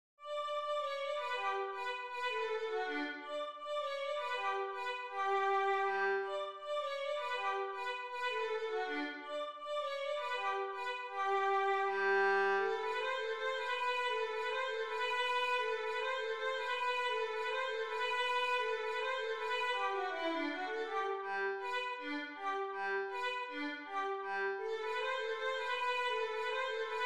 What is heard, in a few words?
halling violin